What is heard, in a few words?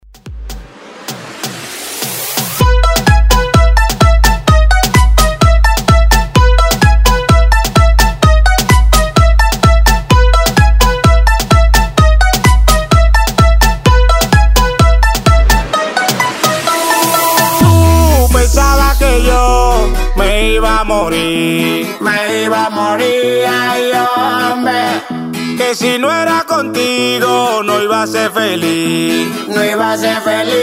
Latin House; Redrum